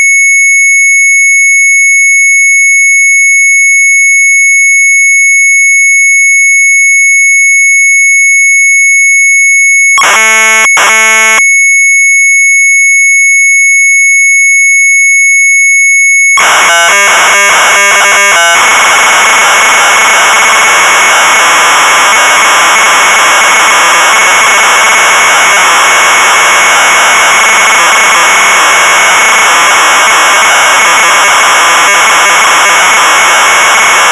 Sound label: MZ800 Tape; sharp; GAS & LICHT; retromelsarchive